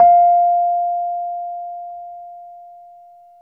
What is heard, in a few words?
RHODES EP2 F4; Sonic Reality - Vintage Timetraveler